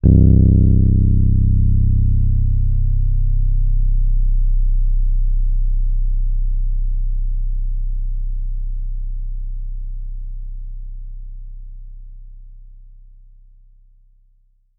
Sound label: Rhodes forte c1; Best Service ProSamples vol.42 - Session Instruments